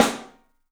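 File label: PICOLO SNRS, snare, drums; 3D Sound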